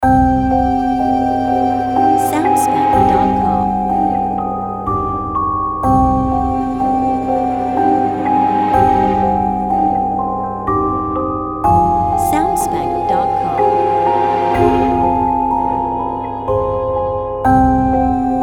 Ghost In The House No Fx Voice

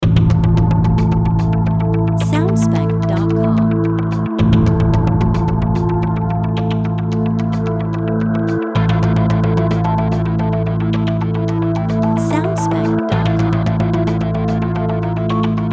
Dynamic Tense No Guitar

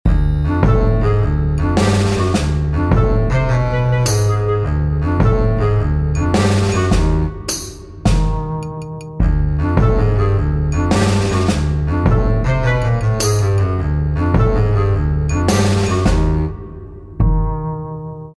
Wicked Cartoon Characters Loop

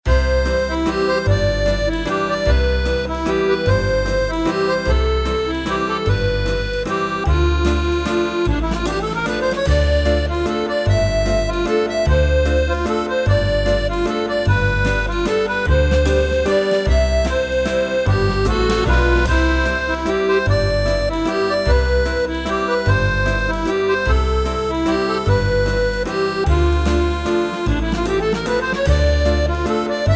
Without Strings

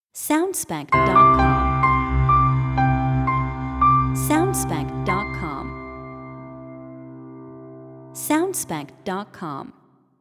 Elegant Piano Logo

